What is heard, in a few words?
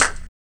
RSS PERC